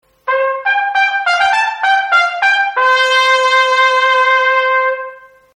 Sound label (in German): Trompete low